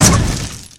impact; Gotham City Speed

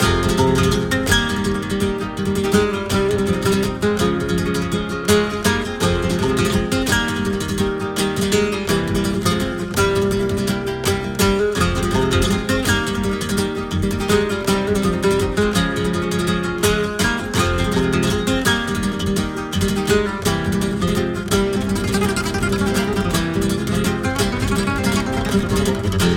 end music loop; The Adventures of Puss In Boots - Barrel Run; NextlevelGames

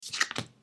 memory reveal; Boss Baby - Matching Pairs

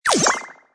power up; Nickelodeon Basketball Stars 3; ThrillGames

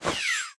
swipe out; run-dorothy

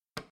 memory tap; Boss Baby - Matching Pairs

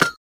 button press; Cartoon Network - Toon Cup 2020

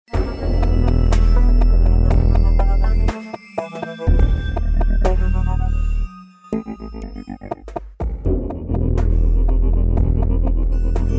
title music; Adventure Time - Elemental; ThrillGames

